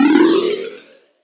wave teleport

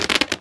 rubberpull, balloon; World Of Goo

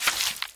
splash, water; World Of Goo

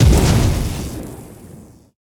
heavy thunder; CrossCode; battle

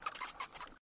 batsqueak; World Of Goo

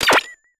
snowtank button; boss

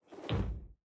fall from chair

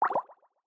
bubble appear

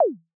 menu cancel

